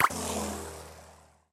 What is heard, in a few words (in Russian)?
Тап для раздела на общей карте